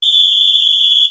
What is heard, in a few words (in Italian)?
fischio rigore